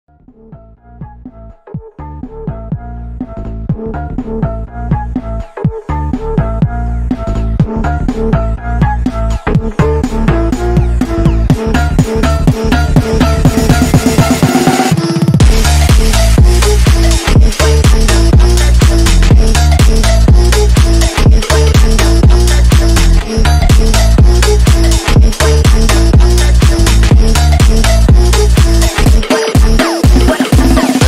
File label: phonk